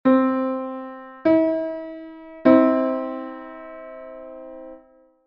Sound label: 0-360 neutral third (10-EDO)